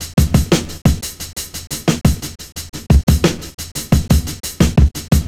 Drums; Sonic Foundry (Sony Creative Software) - Stylus Pressure Urban Grooves on Digital Wax; Matrix Z

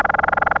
BRRRR; Zero G; Man Machine

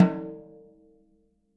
SN OFF, snares

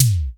SIMMONS TOM; Crypton - DrumBase5000

